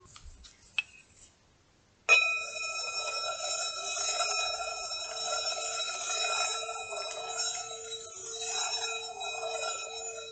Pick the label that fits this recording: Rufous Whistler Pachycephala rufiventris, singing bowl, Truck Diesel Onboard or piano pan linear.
singing bowl